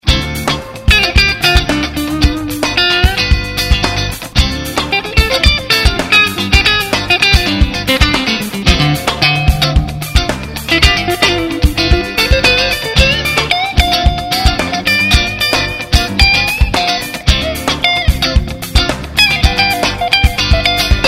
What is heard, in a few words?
clean; MMsilSpecial; silhouette